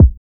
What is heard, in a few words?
C# KICK TM88 Kick